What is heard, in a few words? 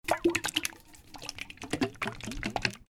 paint tin shaking